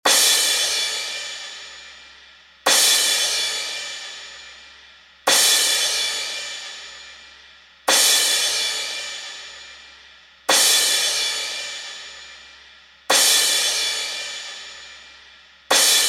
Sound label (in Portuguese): Toque - Prato